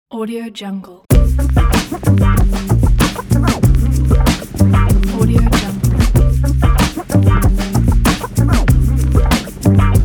vintage breakbeat funky intro logo; dagored

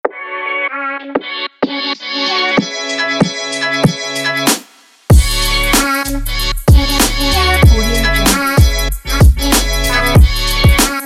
hiphop in hiphop

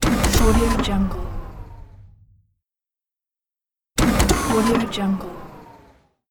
user interface mechanical turning off; inspectorj

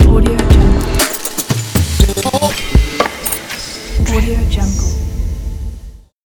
modern ident; envato trends; ellmusic

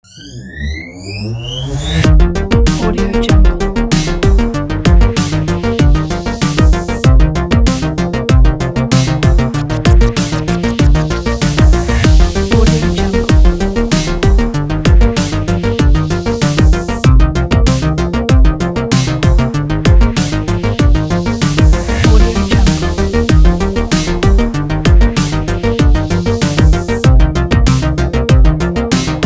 game computer music

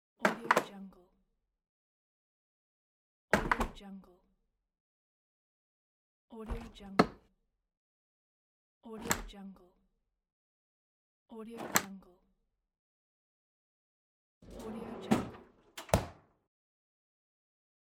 refrigerator sounds soundpack

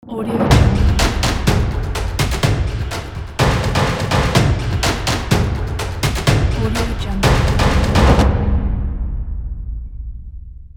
percussion logo reveal

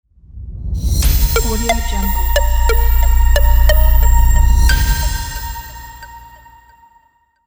business bright logo

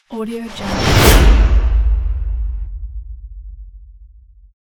widened woosh; soundwood

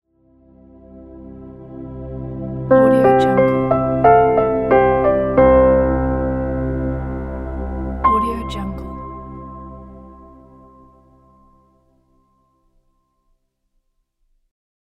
elegant piano logo

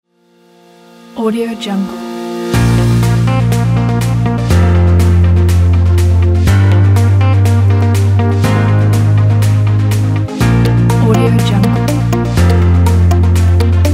sport presentation

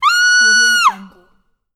female scream

